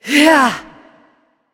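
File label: grunt attack, female; smite; voicepack; hera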